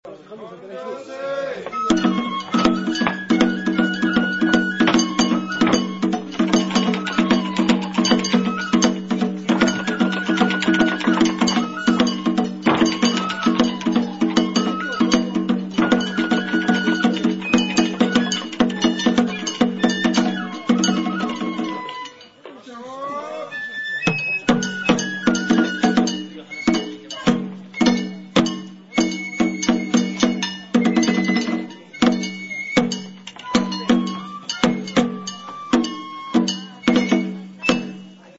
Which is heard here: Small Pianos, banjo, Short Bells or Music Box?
banjo